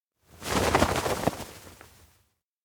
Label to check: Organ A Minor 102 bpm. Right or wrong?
Wrong